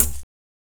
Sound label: soft hit normal; osu!